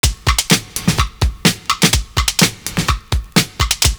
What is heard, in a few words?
HORSE BEAT; Univers Sons